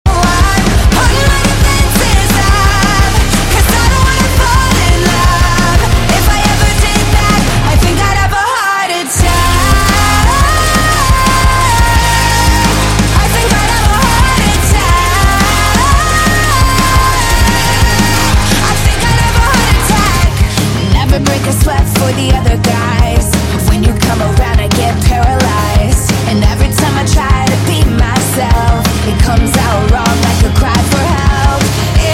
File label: rock version